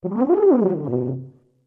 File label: Tuba Gliss Up and Down